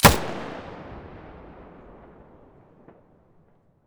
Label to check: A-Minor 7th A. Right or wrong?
Wrong